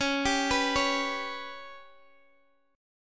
B Suspended 2nd Sharp 5th

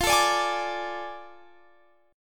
A Major 7th Suspended 4th Sharp 5th

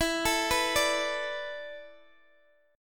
E 7th Suspended 4th, Guitar, DADGAD

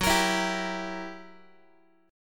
F sharp 7th Flat 9th Guitar, chord; x,14,13,14,11,x; 4A,4E,5A,5D,5Gsharp,6Csharp; strummed

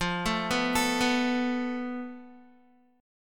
F Major Flat 5th, Guitar; FMb5 arpeggiated; JGuitar

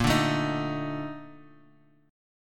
A sharp Major 11th A sharp Guitar Half Step Down; A#M11

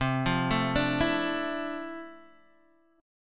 D Minor 9th

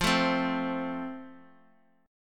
F Major, F Chord; strummed

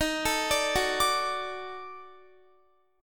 Eb Minor Major 7th Flat 5th Eb down Guitar; EbmM7b5; arpeggiated

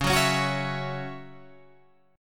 Db Minor Double Flat 5th Db down Guitar Open G

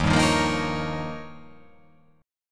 D sharp 9th D sharp

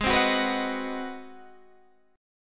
G sharp 7th Sharp 9th, Guitar, DADGAD; 18,15,16,16,18,16; down; chord shape

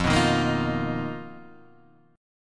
B Major 7th Flat 5th F, chord shape

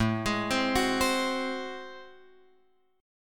A Minor Major 13th; {5 X 6 5 7 8} arpeggiated

